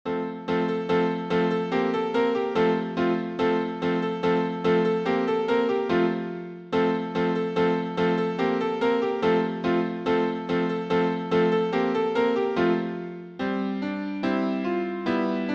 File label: Mexican March